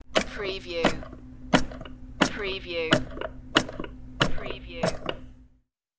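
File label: Soap Dispenser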